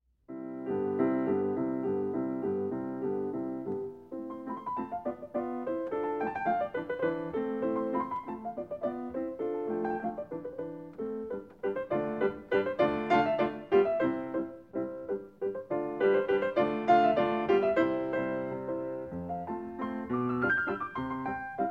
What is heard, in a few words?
Mazurka